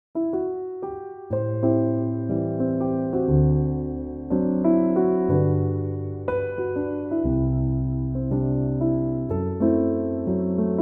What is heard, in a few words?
jazzy piano instrumental